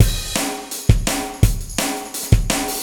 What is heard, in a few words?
beat, breakcore breaks; DOA breaks